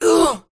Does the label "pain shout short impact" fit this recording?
Yes